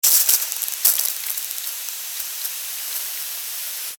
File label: Extermination, Pesticide, Can, Spray, Insect, Bug; Sound Effects:Animals:Insects; Blastwave